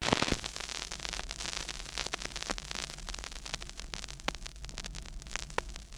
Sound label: Crackle, Vinyl Noise